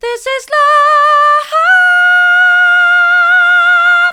this is love mid, vocal

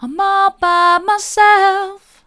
soft, vocal

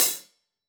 KHats HlfOp

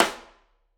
Piccolo, drum

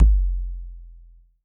Kick, drum

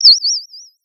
Sound of a bird tweeting nicely

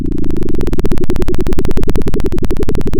Sound of a plane taking off

